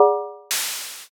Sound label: basket